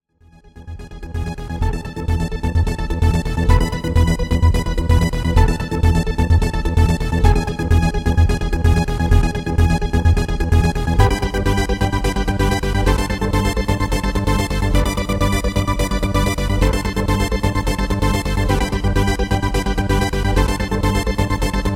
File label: music bassline ringtone